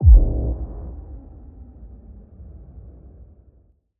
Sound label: Boom, Effective Trailer Booms; Federico Soler Fernández